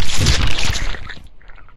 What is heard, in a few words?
player death; minetestforfun game; nalc server